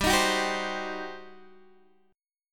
G Major 7th Suspended 4th Sharp 5th G down Guitar DADGAD